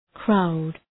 crowd

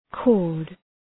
chord

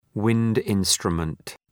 wind instrument

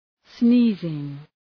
sneezing

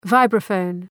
vibraphone

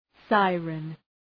siren

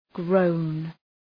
groan; {grəʋn}; rënkim; ofshamë; psherëtimë e rëndë; kërcitje; flas me rënkim; rënkoj; ofshaj

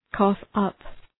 cough up

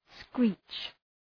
screech

